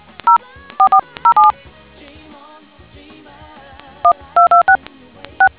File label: dtmf